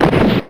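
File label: package not found; The Wild Thornberrys Movie; csomag nem talal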